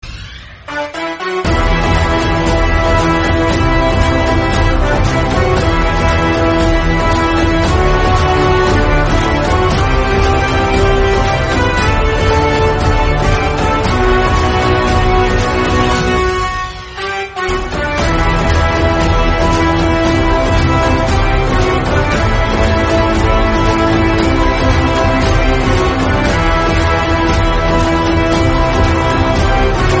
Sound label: entry bgm instrumental; rocky; kgf 2